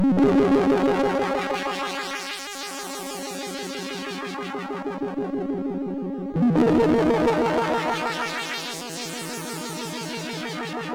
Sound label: Ambient Music, MOS6581R2; Eeben Aleksi